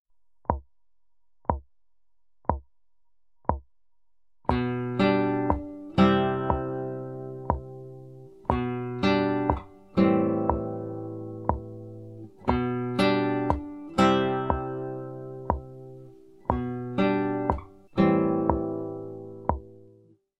picking

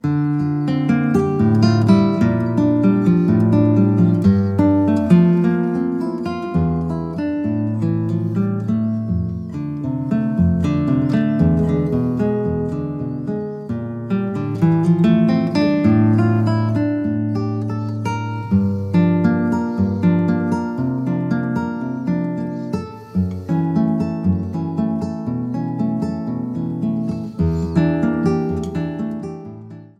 milonga estudio

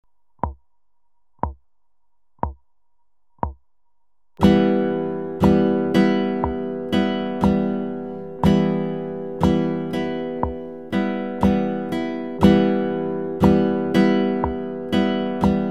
strumming

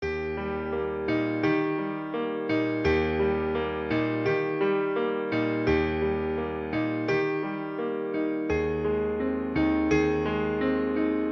music playalong

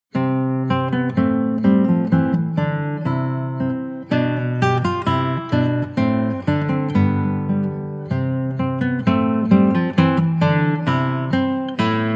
gavotte

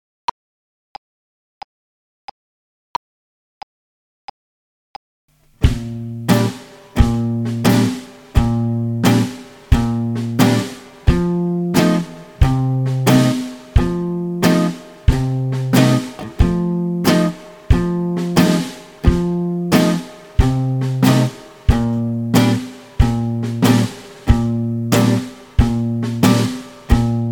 two beat slow

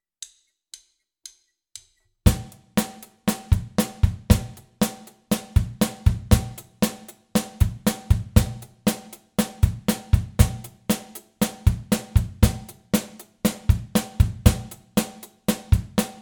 60s soulbeat

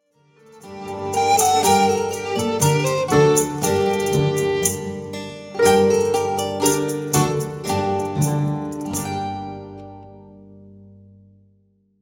bourree

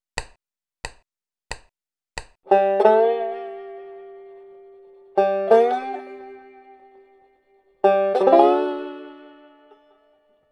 slide vibrato

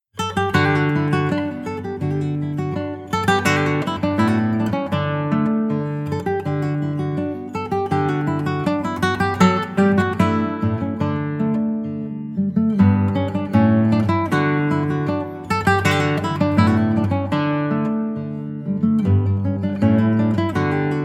canario; carlo calvi

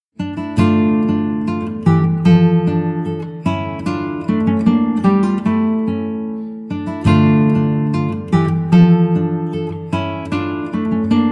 bourree; robert de visee

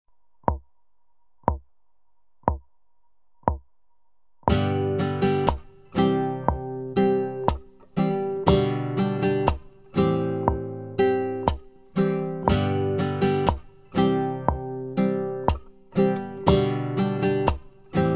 strumming ironic

